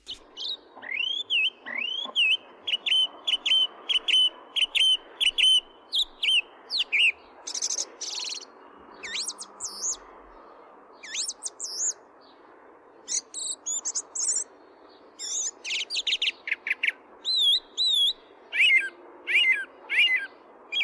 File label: Song Thrush